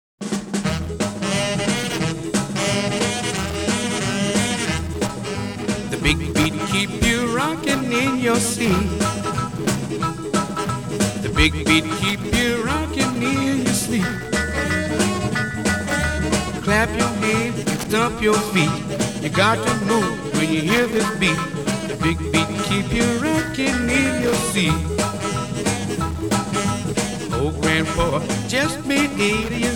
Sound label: Orchestra